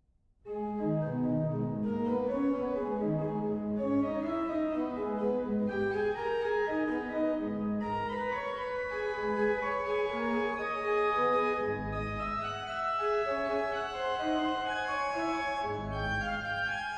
prelude and fugue in g minor organ; bach; bwv 535

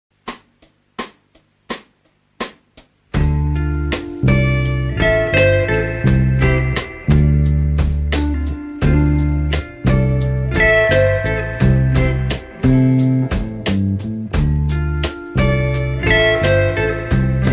instrumental

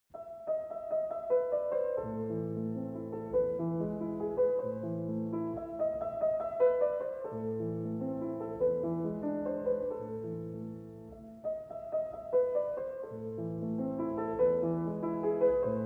bagatelle for piano in a minor; fur elise; woo no 59

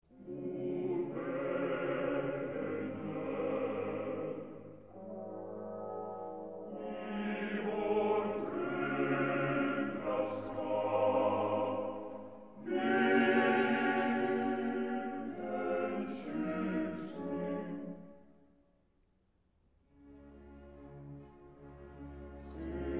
choir; wolfgang amadeus mozart; the magic flute; the last trial

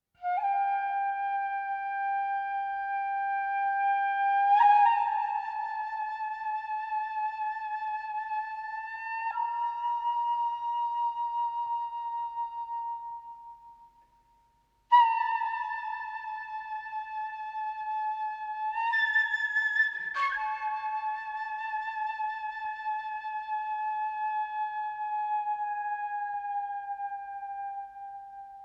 flute; ran; tsurumarus

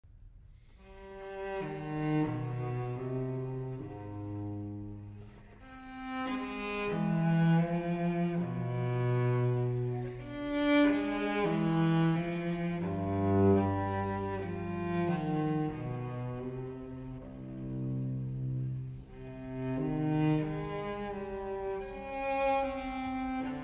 cello suite no 5 in c minor sarabande; bwv 1011

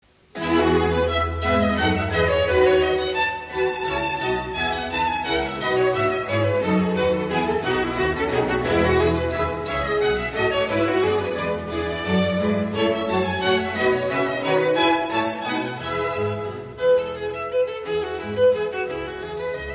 violin concerto in e major allegro assai; bach

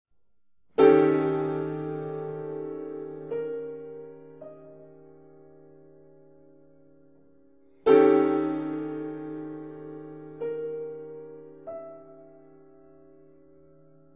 elegy for piano in a flat; richard wagner; wwv 93

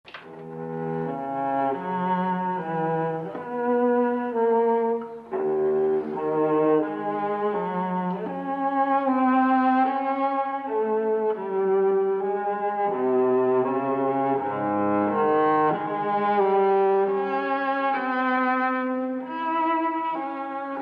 sarabande; cries and whispers; ingmar bergman; bwv1011; johann sebastian bach

